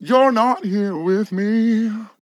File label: MAN LYRICS Fills 120bpm Am; you're not here with me